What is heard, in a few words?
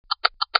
CLOCK TICK